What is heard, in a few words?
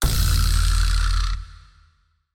doon